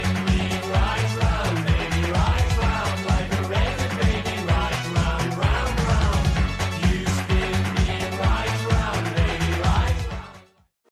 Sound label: 80s remix ringtone; iphone 3g